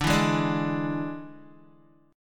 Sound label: D Suspended 2nd Flat 5th D Guitar; strummed; 4F,4A,5C,5G,5C,6E; 9,7,8,7,8,x